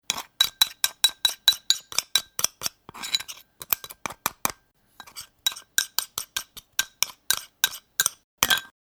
Scraping The Bowl, Scraping the remains of food from a bowl.; Dishware (Sound Effects:Household)